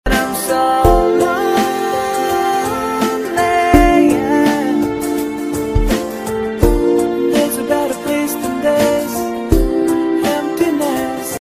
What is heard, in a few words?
Male Ringtone; Indian Pop